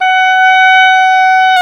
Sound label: oboe, Oboe Brth